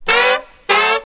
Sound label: TURNTABLES, Hip Hop